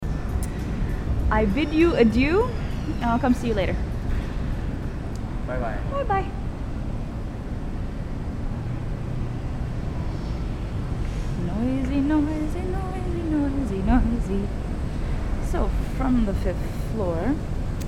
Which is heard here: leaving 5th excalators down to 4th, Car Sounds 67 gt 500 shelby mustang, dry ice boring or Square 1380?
leaving 5th excalators down to 4th